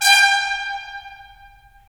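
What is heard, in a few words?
brass trumpets staccato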